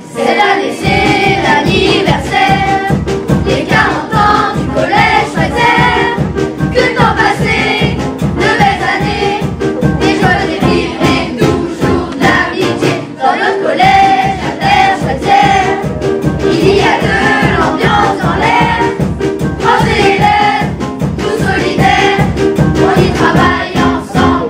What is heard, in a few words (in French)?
sonnerie hymne du college